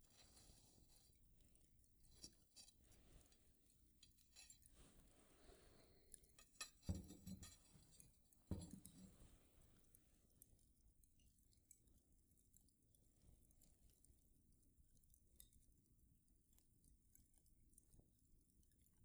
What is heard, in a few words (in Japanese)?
ほっぺ; 初日・囲炉裏